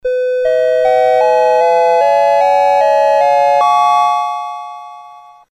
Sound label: sid CSG8580R5, GAMES; Crossword